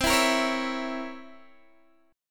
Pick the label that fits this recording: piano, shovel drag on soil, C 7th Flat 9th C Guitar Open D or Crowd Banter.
C 7th Flat 9th C Guitar Open D